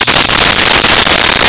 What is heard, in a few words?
welding torch